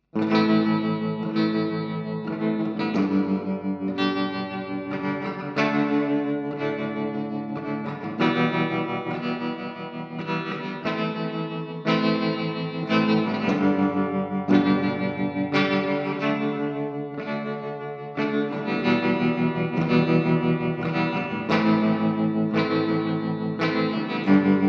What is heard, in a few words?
Guitar